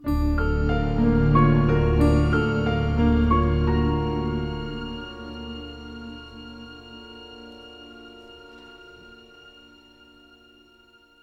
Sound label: suspence short; Chrome